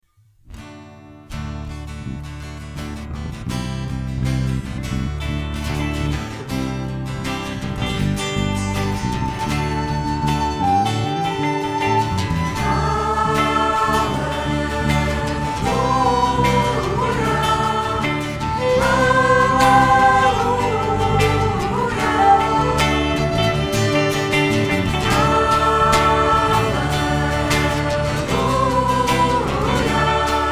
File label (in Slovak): Nedelna omsa - Aleluja